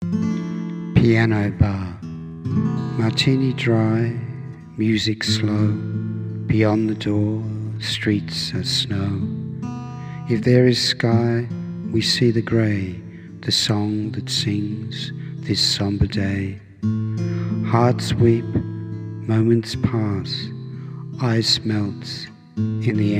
piano bar

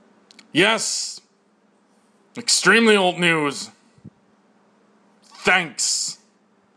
fart

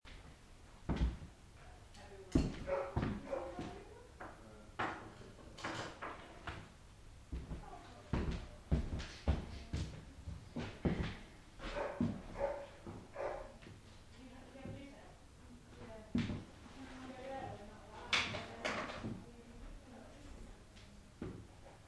museum ambience

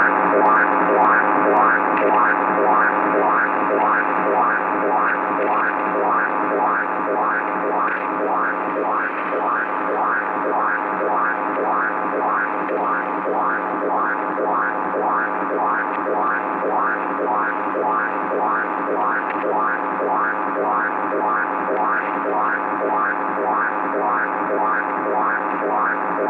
HF sweeper 4 kHz

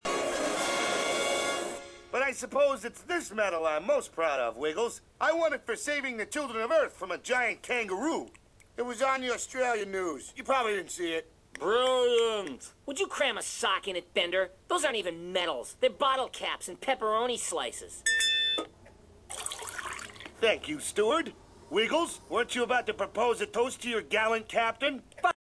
laughing; at futurama

